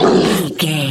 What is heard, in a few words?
troll monster hurt pain